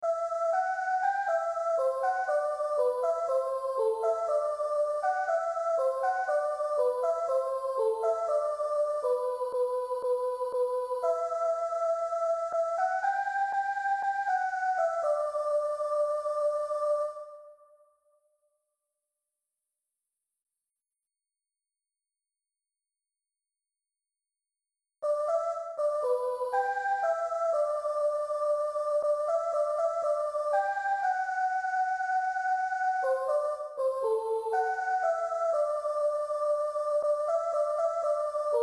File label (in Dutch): sopraan midi